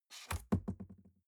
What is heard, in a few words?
cardboard box open unfold bounce; objekte